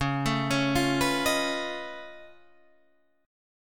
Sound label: Db Augmented 9th Db down Guitar Open D